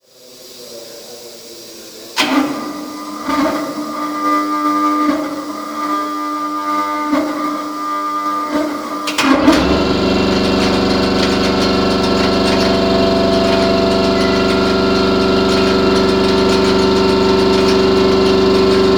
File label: motor